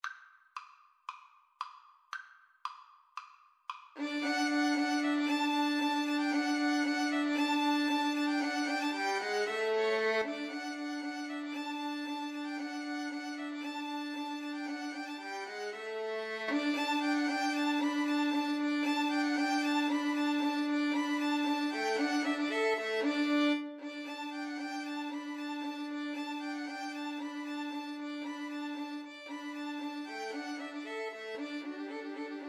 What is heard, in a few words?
spring, Violin Trio